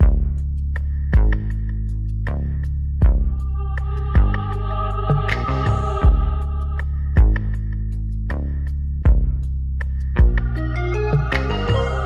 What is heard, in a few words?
sinister rnb, Electronic Loops